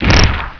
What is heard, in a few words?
Bodyslam; The Crow City of Angels